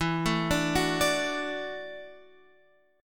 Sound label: E 7th Suspended 2nd Suspended 4th, chord